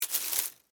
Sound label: Insect Dig Foliage, Leaves, Bug, Movement; Animal; BlastWave